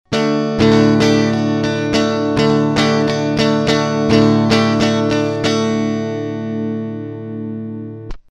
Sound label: Behringer MIC300 gtr Gain 50 Out 50 phase